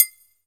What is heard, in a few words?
Triangle Closed A; Zero-G Jungle Warfare 1, 2 & 3; 90 s Sample CDs